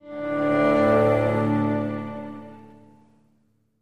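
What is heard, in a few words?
Music Transition Cello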